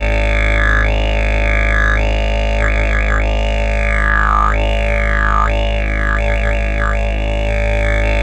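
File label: A flat drone simple, Didgeridoo; Big Fish Audio; Didgeridoo and Other Primitive Instruments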